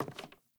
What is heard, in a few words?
Sandals Walk Wood; Characters; Player